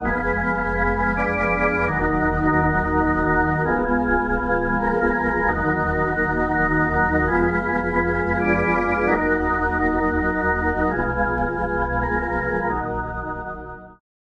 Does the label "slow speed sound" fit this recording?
No